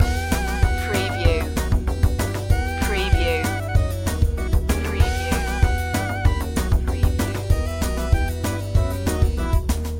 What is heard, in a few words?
the spooky pumpkin head loop